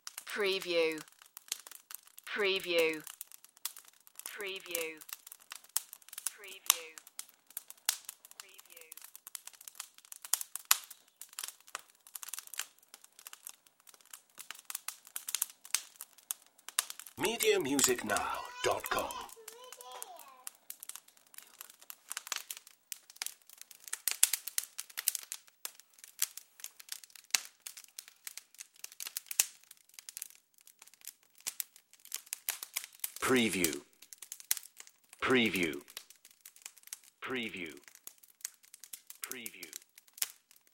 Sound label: Crackling Fire